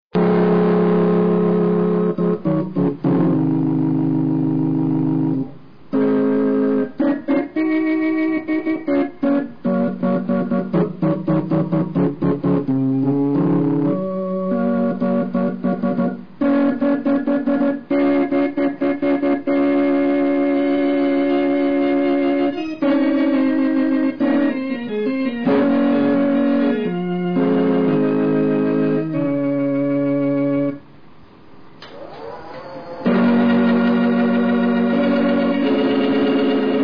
orgao

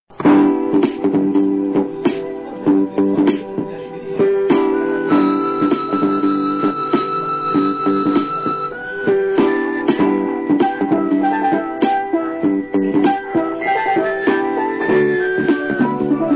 orgao ritmo

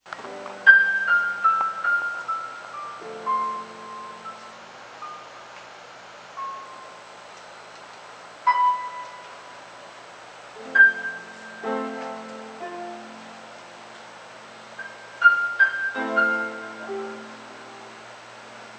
pianinho

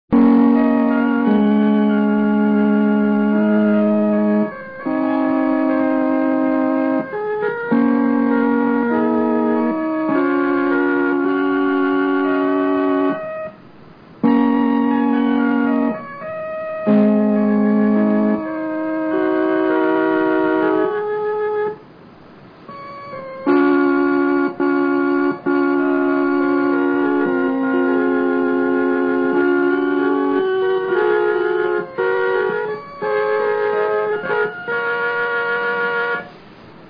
orgao

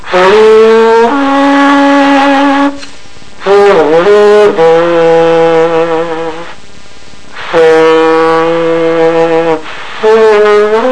sopro

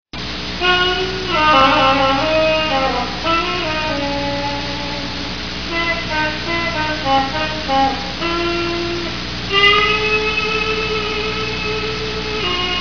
sopro

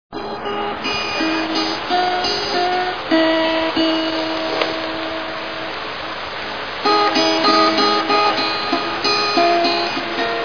violao

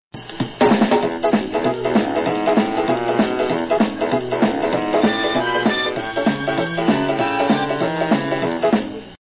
ritmada

